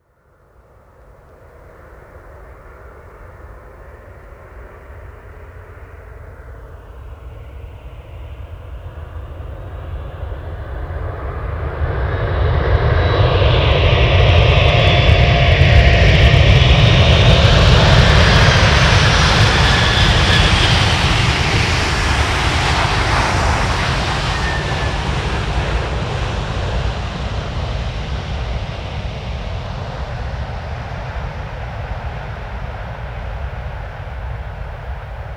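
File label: Landing 737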